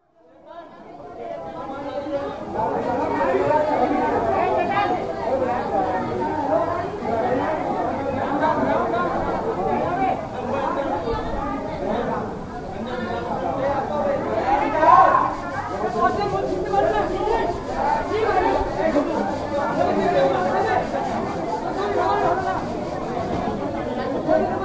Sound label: oil rush hubbub; radhakund